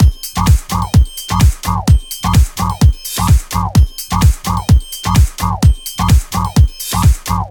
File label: 128BPM Samba Kit; VFH1 128BPM E Samba Kit